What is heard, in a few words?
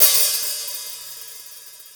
CYMB; AMG Gota Yashiki Groove Activator; GOTA CYMBALS